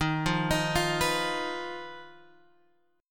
Eb Suspended 2nd Sharp 5th, Guitar, Open G

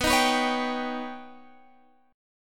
D sharp Augmented 7th B, guitar

